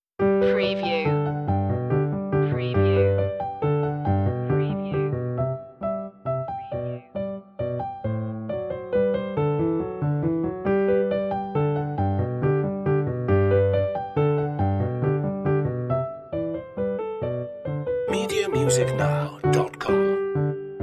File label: bach minuet g major; notebooks annamagdalena bach; bwv anh116